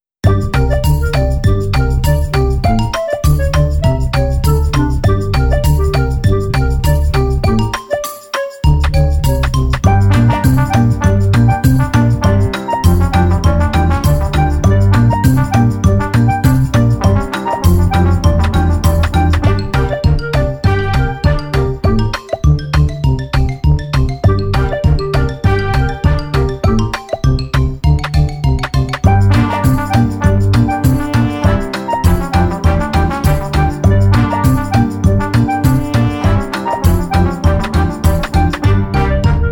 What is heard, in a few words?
MUSIC only; stereo; CLEAN